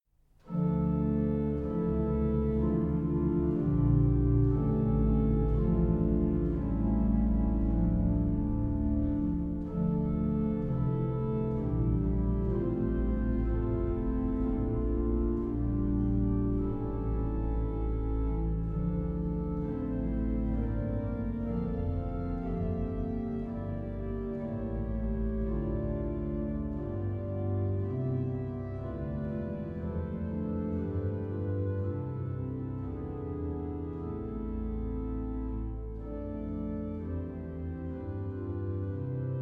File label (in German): Sonate d-moll Choral Andante sostenuto Allegro molto